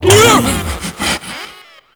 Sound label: Pain; Warframe; Proto Infested; Ape Prelate